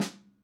Snare Tight Low Vol

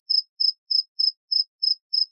Cricket Grillo

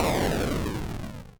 Boom

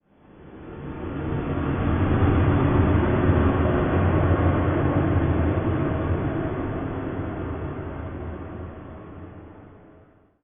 Horror Ghost

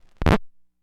45 Rpm Needle Off

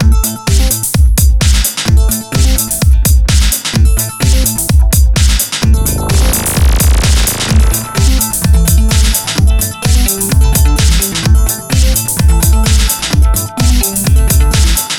Tight Tie Retro Loop

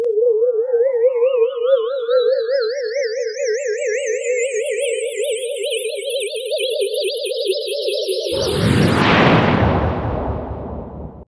Ascending Ufo Fast Takeoff

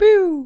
Pew

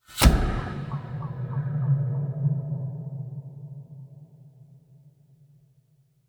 Trailer Hit

